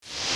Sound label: FLIP DOWN; New Wave Hookers